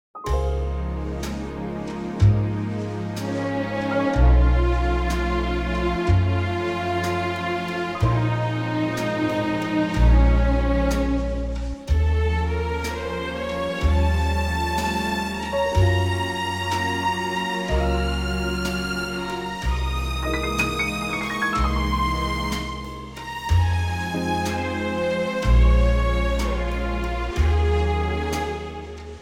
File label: Db orchestral; key - Db